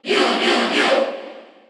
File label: Cheer Korean; Ryu; SSBU